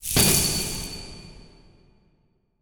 UI Ice Confirm; User Interface

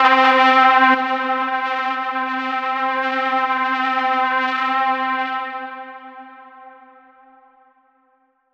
Synth Pad C, Trap One Shot; HipHop; Melody Kits; 150 D#m; Ghosthack; Ghosthack - AC2024 - Day 5 - Melody Kits; Creeps

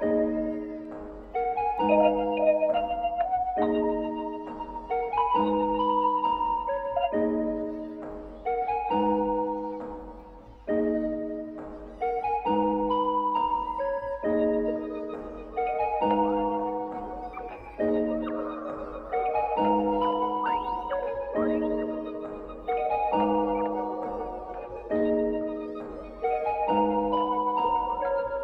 135 BPM D# Min Bells, Loop Stems; Melodies; Melody Mystery Expansion; Fake

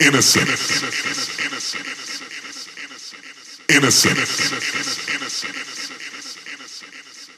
Vocal Loop 130, Techno; Techno Sounds 2024; Vocals

